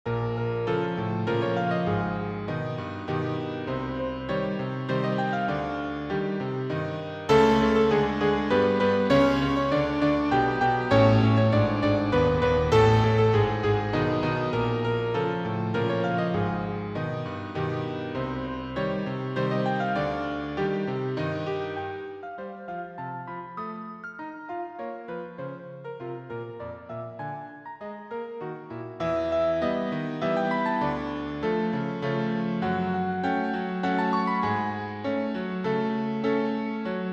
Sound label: waltz